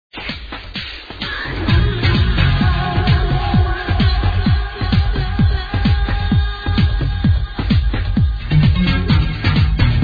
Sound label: prog groover; A nice flowing prog tune